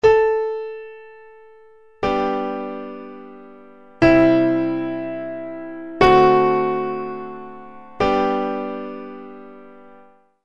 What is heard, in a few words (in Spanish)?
cadencia